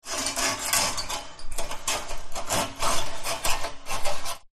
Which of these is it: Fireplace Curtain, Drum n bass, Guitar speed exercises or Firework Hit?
Fireplace Curtain